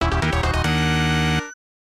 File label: Battle Intro; Shinseiki Odysselya; SNES